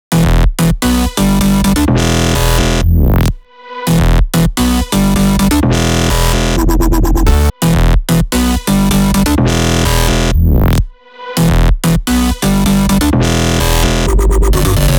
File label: Piano Melody Beat Loops